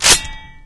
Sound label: pump action forward, weapons; widow maker; tf